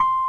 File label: ELEC. PIANO; OMI - Universe of Sounds